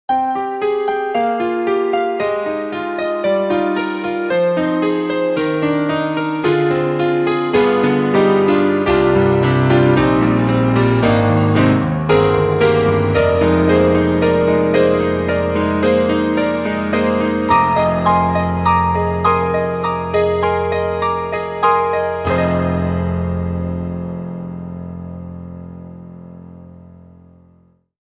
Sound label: U-220 + GMega; KAWAI